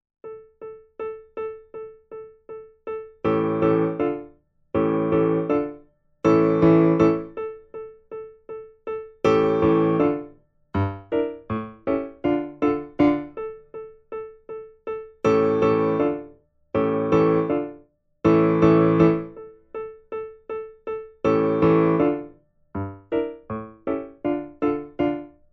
Secondo, Vierhaendige Uebungsstuecke; Diabelli

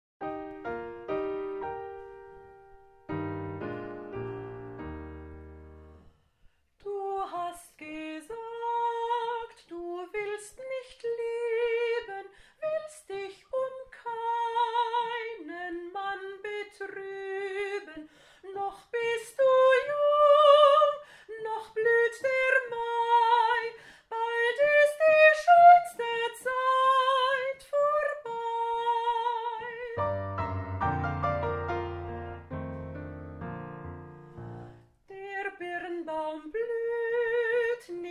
Playback Nur Gesang; Playbacks; Warnung; Koessler

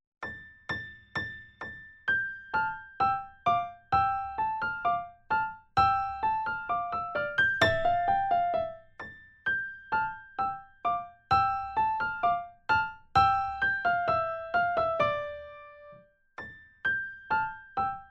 Primo, Vierhaendige Uebungsstuecke; Diabelli

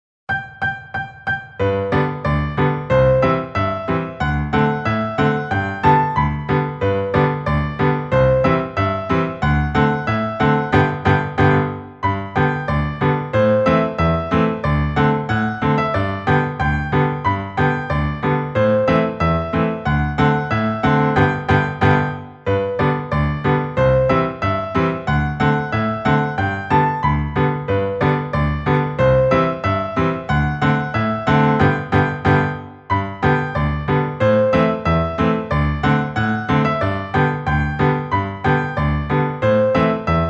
Zauberklavier 184 All; Uebungs-CDs; Playbacks; Little Brown Jug

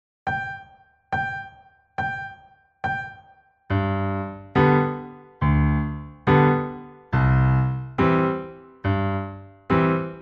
Zauberklavier 70 Secondo; Uebungs-CDs; Playbacks; Little Brown Jug

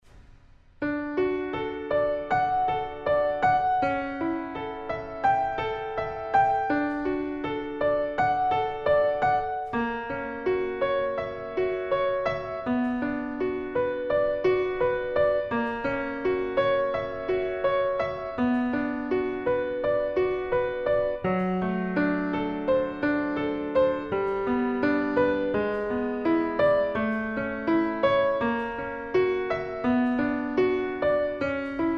Solfeggio D-Dur Langsam; CP Bach

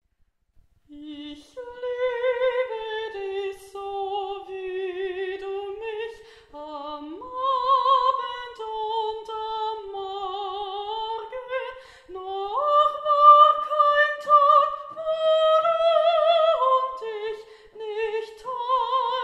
G-Dur Gesang Playback; Beethoven Liebeslied

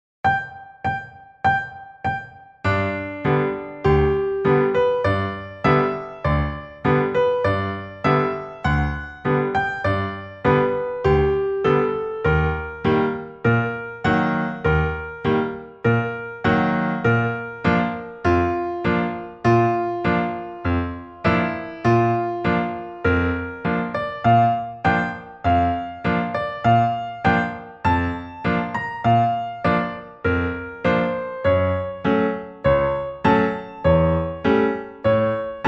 Zauberklavier 100 All; Uebungs-CDs; Playbacks

